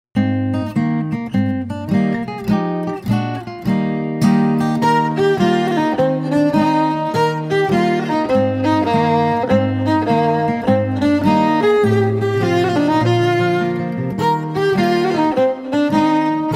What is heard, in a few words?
playing on violin; one piece